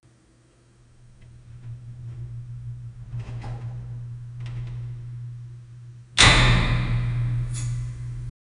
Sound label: safety vault being opened